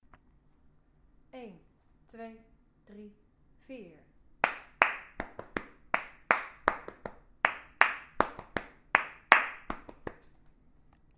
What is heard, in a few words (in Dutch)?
ritme refrein